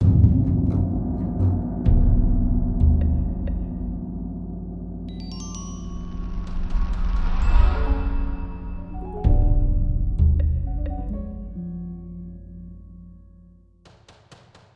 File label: Tao Calm